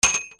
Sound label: INDUSTRY